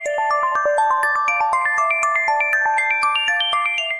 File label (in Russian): sms kak na iphone 6; variaciya smski dlya novogo ayfona